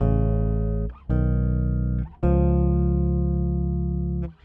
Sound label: Tenth Loop, Bass; Construction Kit; Riffs, Patterns Licks; Bass X